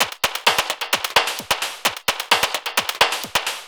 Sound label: Beat, Industrial